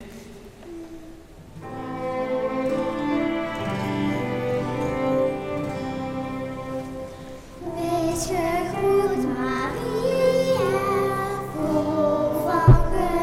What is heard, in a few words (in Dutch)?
gezongen weesgegroet solo